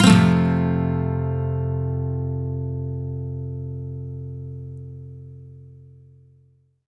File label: MAJ SWEEP F, Electric & Acoustic Guitar Loops; SWEEPS; USB Soundscan vol.04; AKAI